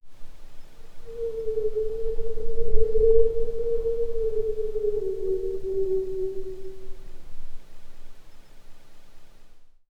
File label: soft low frequency wind, cave